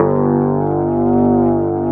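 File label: Wurlitzer G1, Keyboards Old School; USB Soundscan vol.09; AKAI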